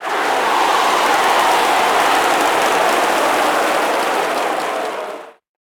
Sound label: Crowd Cheer; Pitch Black Audio Pong